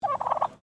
Bird Turkey Domestic Call

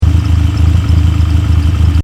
Engine: Tick Over Revs Loop, car; Cars; Vehicles; Stuart Duffield

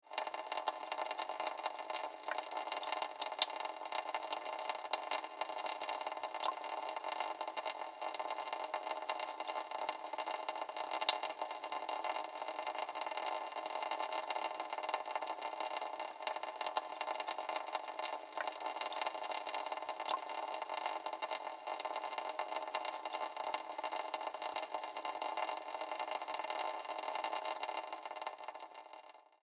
Water Sink Leaky Faucet Drip, bathroom; Household

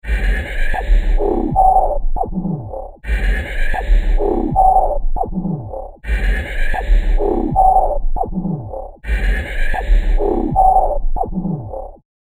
Medical Lab, hospital sound effect; Hospital Sound Effects; science fiction sound effect; Andrew Fleming

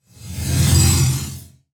Whoosh Sci-Fi Scanner Flange; Blastwave

